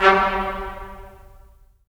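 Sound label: brass trumpets staccato, left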